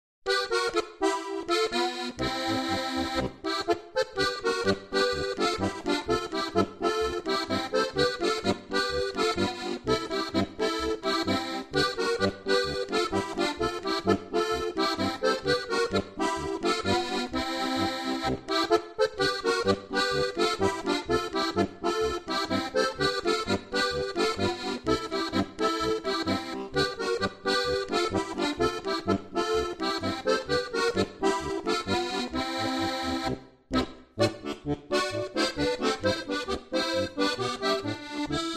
Quetschn Rock

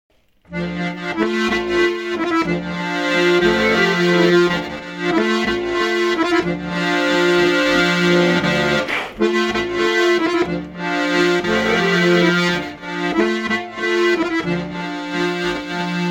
Akkordeon; Ziachorgl

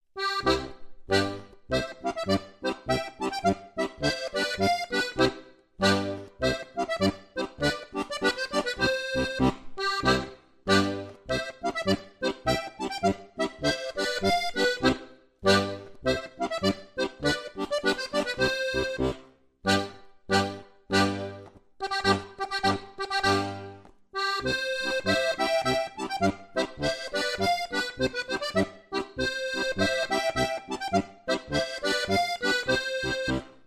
Sechselautenmarsch; Zuircher

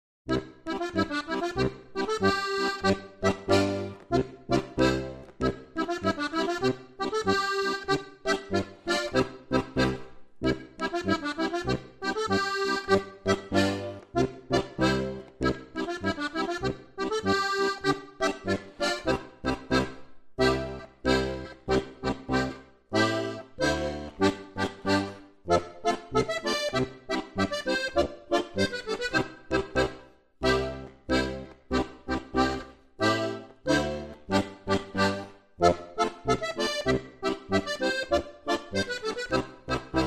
Klappdans

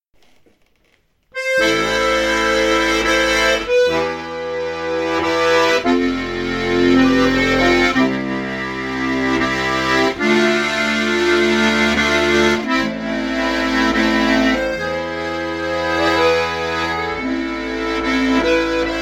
Akkordeon; Ziachorgl

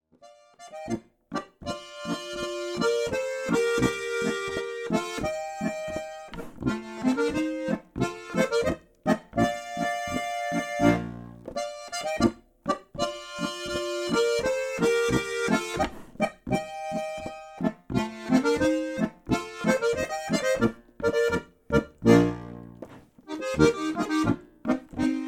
Staketen Boarischer

